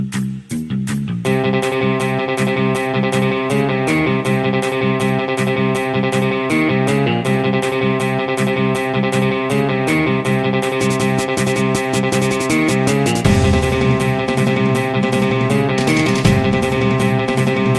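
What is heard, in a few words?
Mass Entry background music Ringtone; Kaththi; Kathiresan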